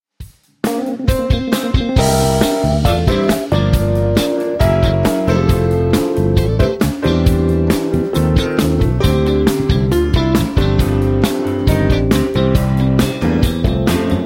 Shine On Me, Gospel Choir

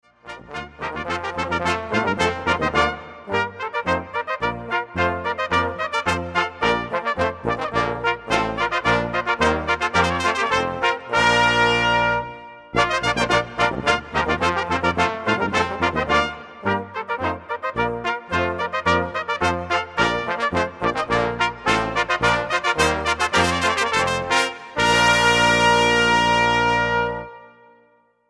Trumpet Tune

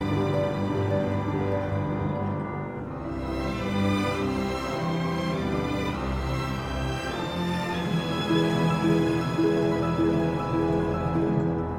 Organ Symphony Finale; From Symphony No 3

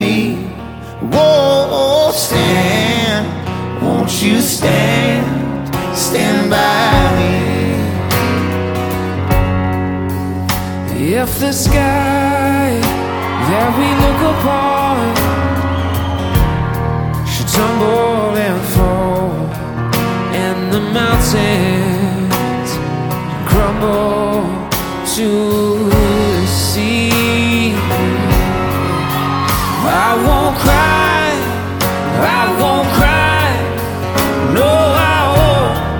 Acoustic Live

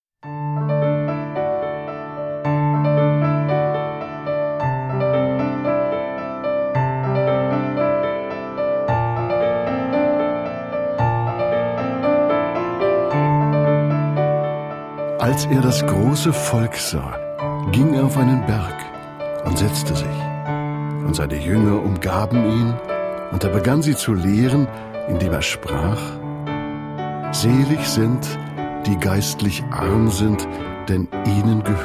Piano Meditation

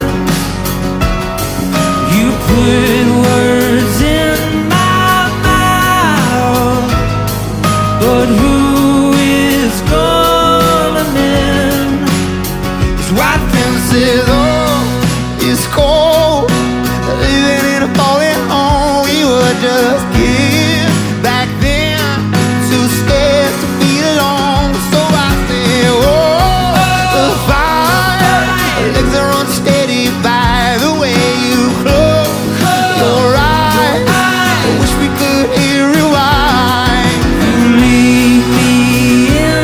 Acoustic Live

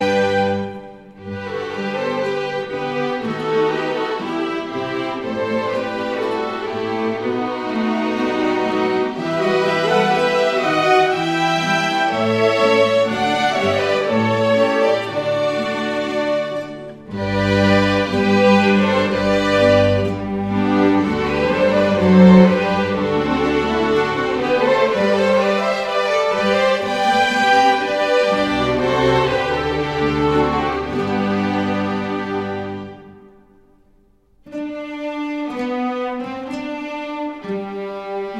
Instrumental

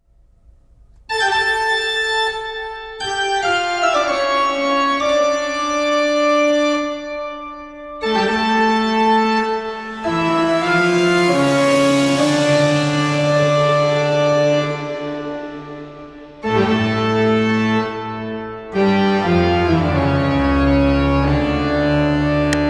Toccata

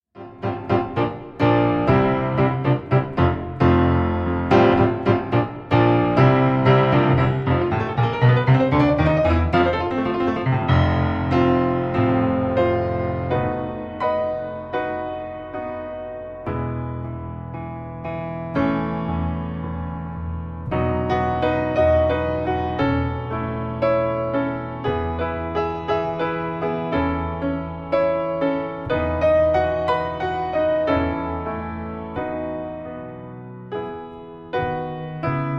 Piano Meditation

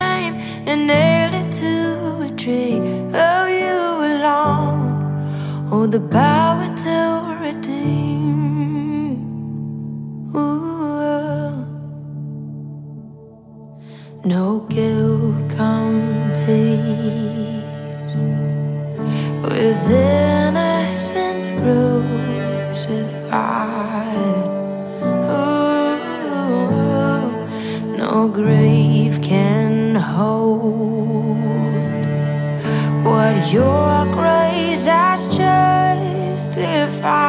Acoustic Version

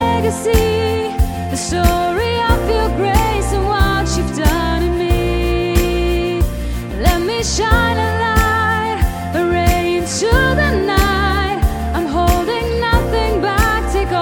Unplugged

